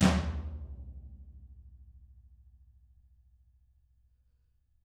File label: DW 16 FLAM, Double Platinum Drums; ILIO